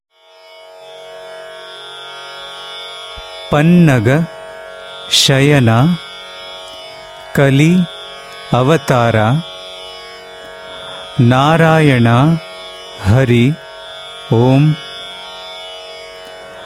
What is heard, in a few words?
bhajan; RADIO SAI; PANNAGA SHAYANA KALI